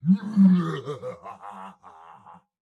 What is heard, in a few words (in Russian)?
Смех; Турбосвин